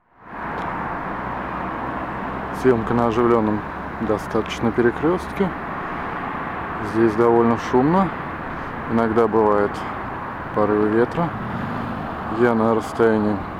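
mke200 noise protection, микрофон для камеры и смартфона; Sennheiser MKE 200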